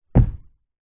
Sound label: foley feet jump land carpeted wood floor hard